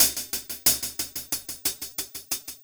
Cymbol Particle; Particles

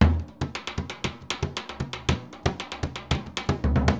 SURDO, MERENGUE; Best Service ProSamples vol.14; AKAI

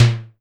SIMMONS SD-5 drums

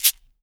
LO AFRO CAB; Spectrasonics; Liquid Grooves 1 & 2

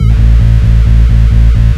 NOISE BASS; Big Beat Samples & More

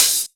Open HH, Cymbals

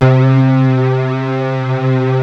DEEP; BASS SOUNDS

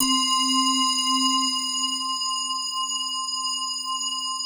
digi pad, Pads

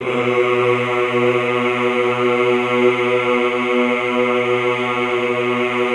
EHH B1, Classical Choir; Best Service; Extended Classical Choir; EHH LO+HI LL

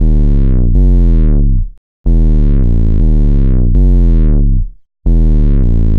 Sub Bass; Sonic Foundry (Sony Creative Software); Knutrix Drum & Bass

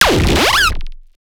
Bass shot

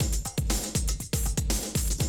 120, Classic Drum Loops

